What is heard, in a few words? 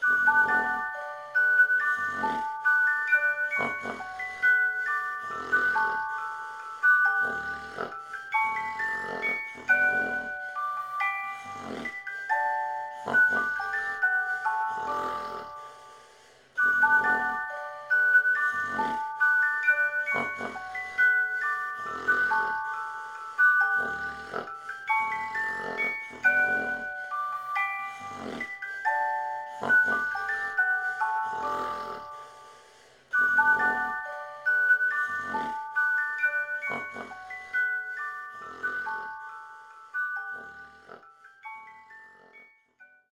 Ambient sleepy room